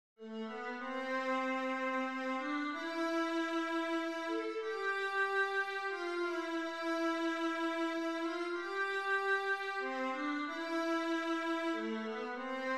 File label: barcarolla viola